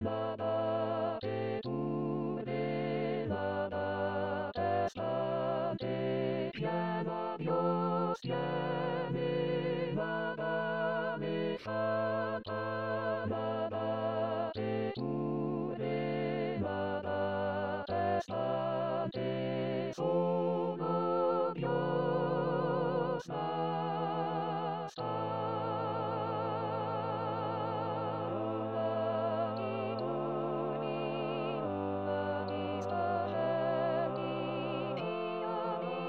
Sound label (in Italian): Solo